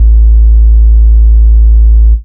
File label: bass sub; Ueberschall; Techno Trance Essentials